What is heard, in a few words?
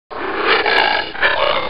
Imp